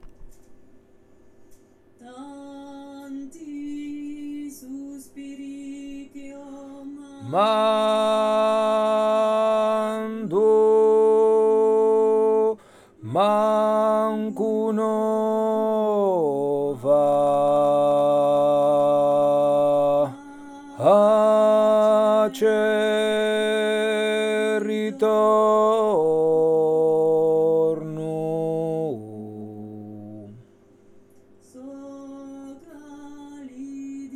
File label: bassu